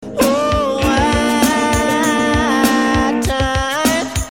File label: Modulation